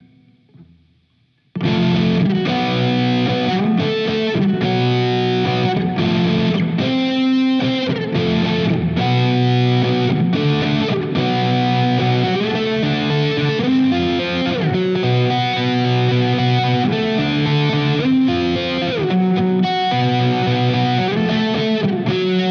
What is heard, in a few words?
FGN J Standard Mythic 7 both